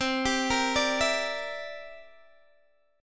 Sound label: C 9th, Guitar DADGAD, chord